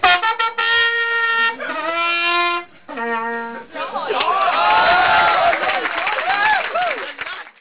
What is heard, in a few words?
horn